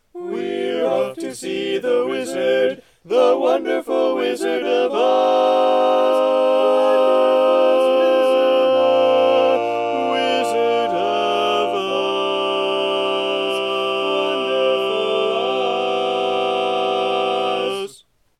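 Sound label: baritone, barbershop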